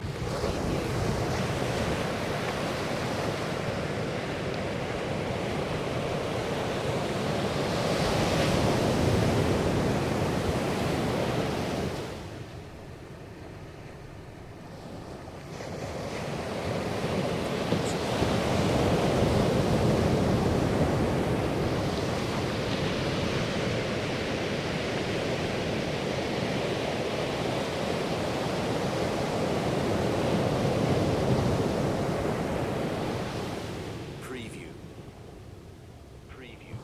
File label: Crashing Waves Loop; beanstalk audio